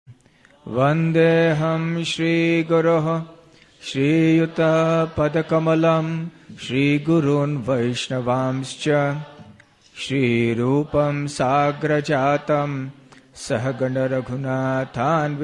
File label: Mangalacarana Sung; Pranam Mantras